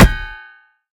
hammer hit; Smite; Bellona

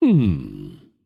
thinking; smite; shiva

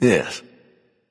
"yay"; smite; merlin

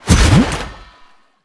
ice activate; smite; god merlin

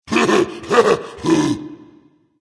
laugh berserk; cuchulainn

